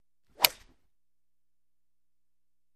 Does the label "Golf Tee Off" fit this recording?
Yes